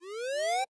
alarm; frontline

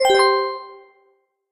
combo; merge fish

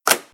button; hexagon glitch 3

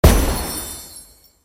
confetti; hangman

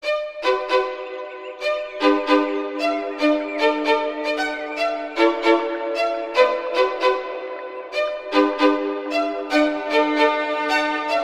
game title; tower defense

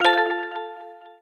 match; match drop

